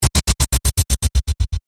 Scanner Burst Chopper, Scifi, Scanner, Burst, Chopper, Metallic, Science, Fiction; Tech; BlastWave